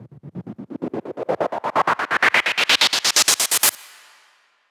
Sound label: FX Uplifter